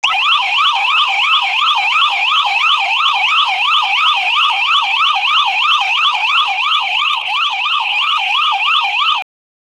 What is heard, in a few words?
siren alert loud short